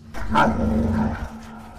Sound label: werewolf hurt; minecraft; technic